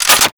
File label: wp foley dragunov reload chamber